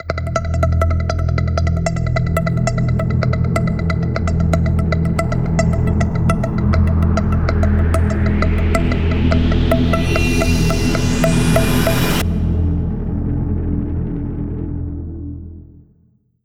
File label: Points Meter; QuizzinMk5.1; NoPoints